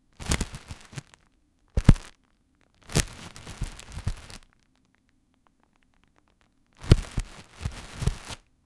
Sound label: bag shuffle and light